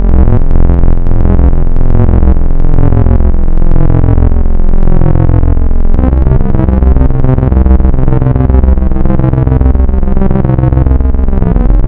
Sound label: Spectral, wavetables; Architecture 32